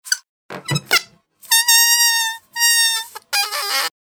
ballon squeek seq

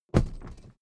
suitcase drop

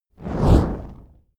fire whoosh torch med flange

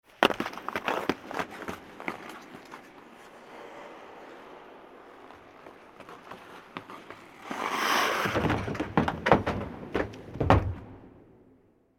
players jump off bench

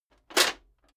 metal plate armor movement single

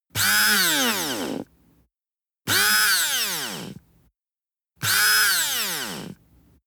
servo drill on off

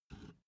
filled can pick up hard

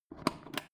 foot switch turn on off

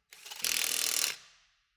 impact wrench screw fast single

